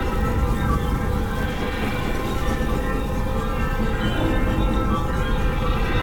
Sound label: pwr channel lp; smite; tiamat